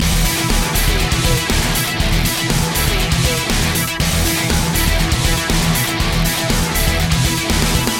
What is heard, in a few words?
remix loop